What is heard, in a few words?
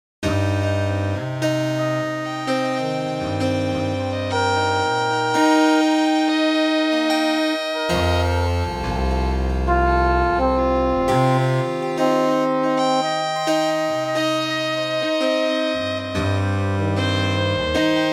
In Just Intonation Dorian Mode